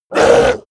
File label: orc hit; kreaturen